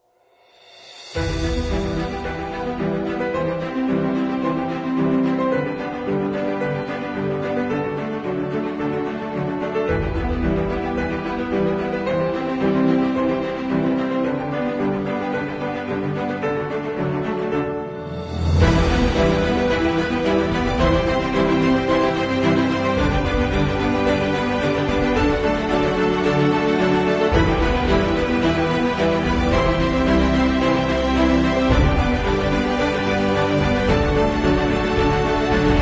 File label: Emotional Inspiring Cinematic Epic Trailer